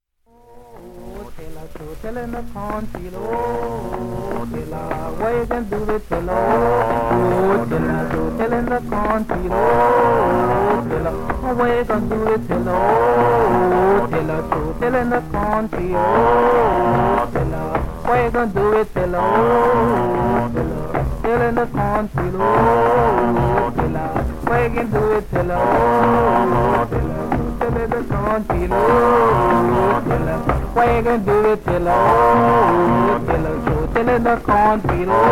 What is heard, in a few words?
Male Group; bahamas; Fox Hill Male Group; Oh, Tilla